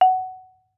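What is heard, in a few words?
Marimba rubber fortissimo Gb4; MIS Pitches - 2014; Percussion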